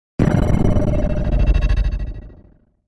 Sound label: Chopper Heli Chime